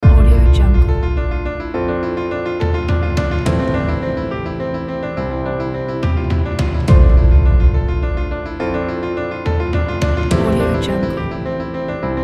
fast epic cinematic

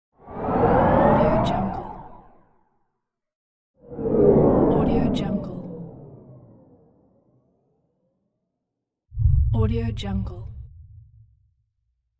transitions whoosh

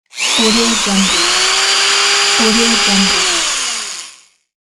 drilling

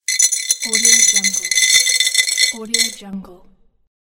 filling coins in glass

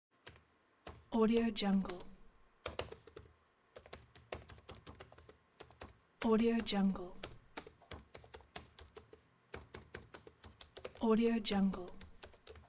long typing laptop

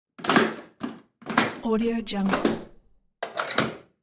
household door deadbolt turn

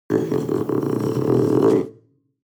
animal panther young grunt deep; smartsoundfx

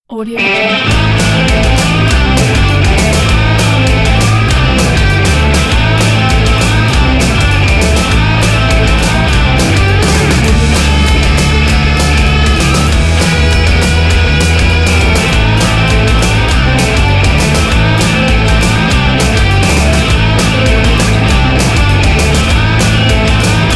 fast punk intro

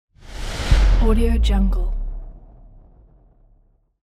fast whoosh

